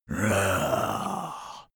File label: zombie moan idle throat